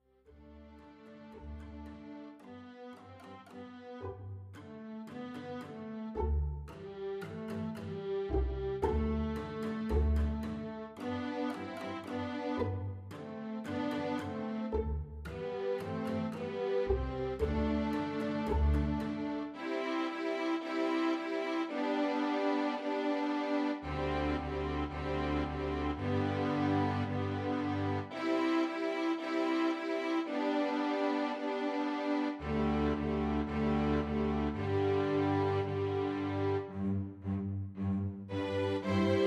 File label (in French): ritournelle cordes court